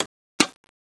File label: airburster clipin; cstrike; weapons